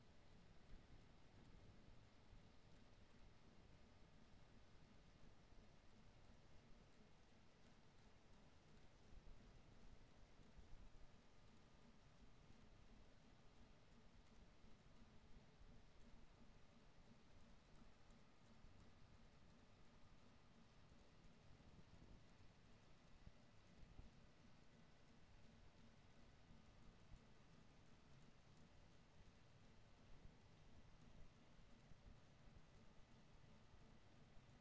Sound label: HYDROPHONE 16000Hz; JASCO AMAR HYDROPHONE 740